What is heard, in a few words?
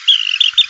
twitter, Empidonax traillii; Passeriformes; Tyrannidae